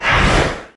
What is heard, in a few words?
rev ended; garrysmod